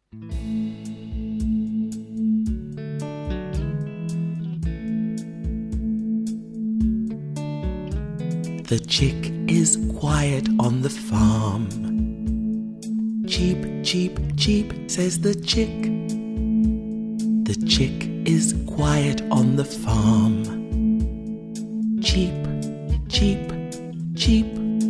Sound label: karaoke